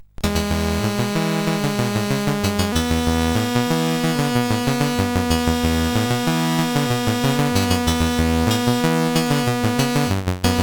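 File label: Magicians Ball, MOS 8580 SID chip music, games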